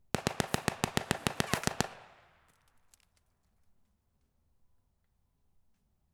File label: M3 Submachine Grease Gun .45cal in front Burst of thirteen